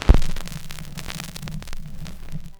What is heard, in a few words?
Record Start Old, Vinyl Record; Record Noises